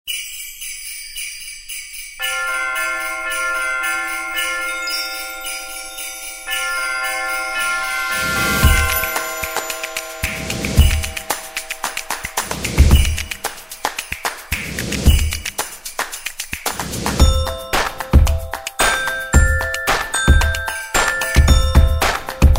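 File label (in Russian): уютная музыка без слов для нового года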